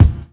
fluffy bass drum sound g sharp key, bass drum sample tuned to the G# note